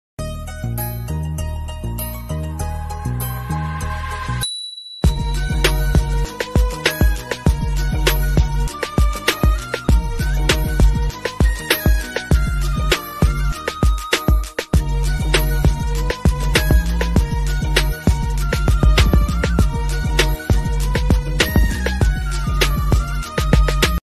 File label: remix bgm instram version tamil love bgm; kadhalar dhinam; south